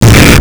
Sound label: explode; HALFE LIFE 3